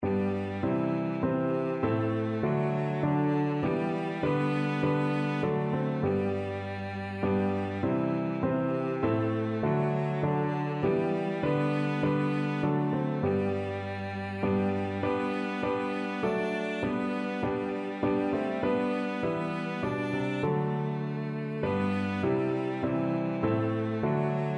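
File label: violoncello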